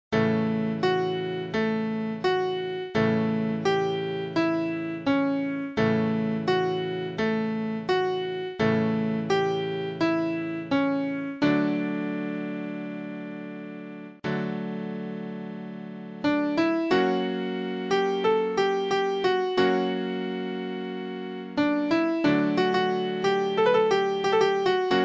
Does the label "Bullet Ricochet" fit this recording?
No